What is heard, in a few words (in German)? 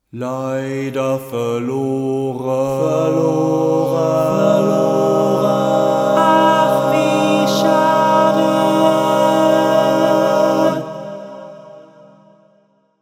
leider verloren chor reverb